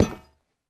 宝库踩踏声

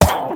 女巫受伤声

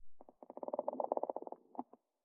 待机声; 嘎枝之心

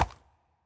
骷髅马水中缓步声

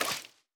湿草地踩踏声

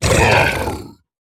猪灵蛮兵死亡声

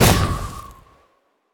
旋风人风爆声

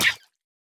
美西螈受伤声

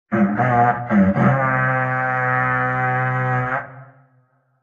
袭击号角声

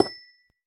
紫水晶放置声

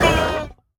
受伤声; 嗅探兽

